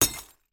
impact glass wine bottle strike other bottles and broken glass debris